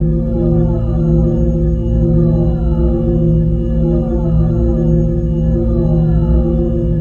Money Magnet; weapons; aronwk collection; ndaudio

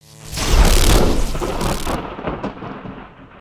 maelstrom strike; creatures organic

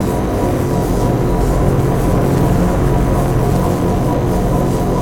ambience, nexus tower; Darkatech Paradox Area

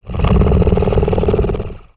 Snore Out; creatures pets

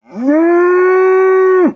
Pet Buffalo Happy; creatures pets; Lego

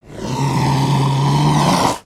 Pets Tortoise Rage; creatures; Lego

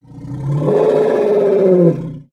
Pets Elephant Beg; creatures; Lego

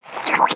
Frog Hat Slurp; Ribbit; creatures organic

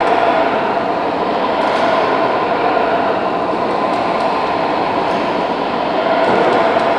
crevice wind; ninjago

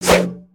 Staff Spinjitzu; aronwk collection; ndaudio

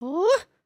breakdance woo, emotes

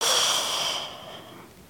snore out

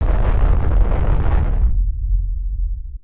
generic landing sequence

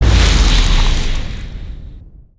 Spinjitsu Ice Tornado Mid Blast

